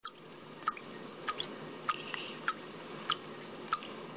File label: water sound